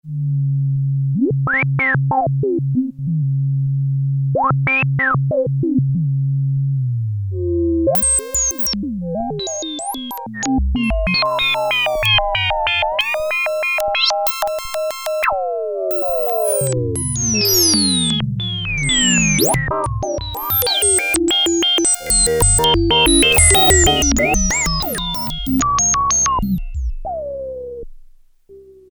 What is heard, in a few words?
Descending Resonances; Sequential Circuits Prophet-5 (Ver.3); Factory Patch; File 2 Programs